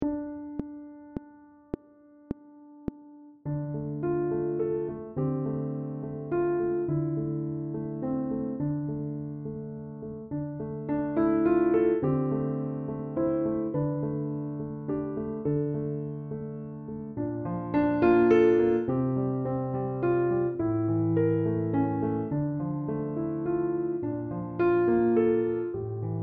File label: Piano Only; Les 1 Oefening 20